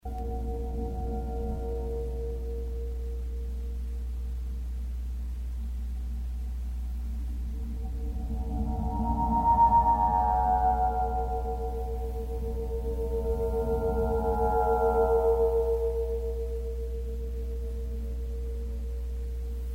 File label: Aeolian Harp